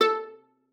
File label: A4, VIOLA PIZZ; Xsample VOL-5